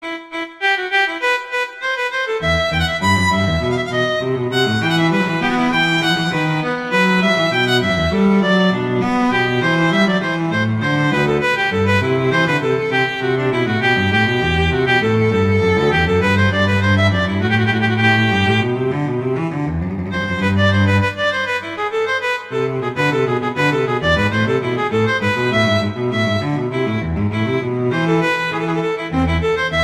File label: Piacevole non largo; Telemann; Canonic; Sonata 4